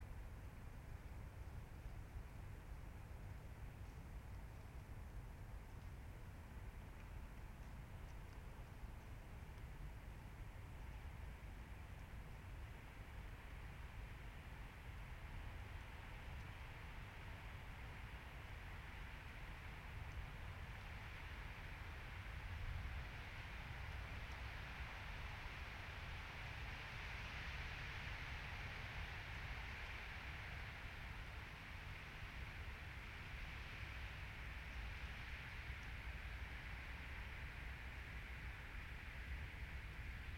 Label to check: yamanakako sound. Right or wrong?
Right